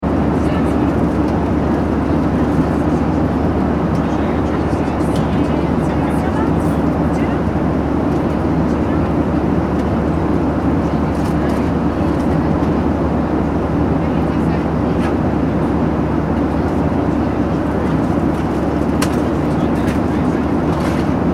transportation aircraft commercial boeing 737 800 interior, In-Flight Ambience; Vehicles; Airplanes; McKinneySound